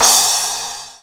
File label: Splash Cymbal Short; GMPercussion; 3DO; Toolkit 1.5; GMPercussion44k